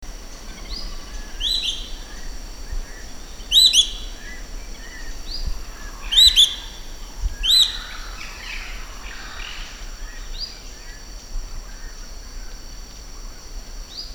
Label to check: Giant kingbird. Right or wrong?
Right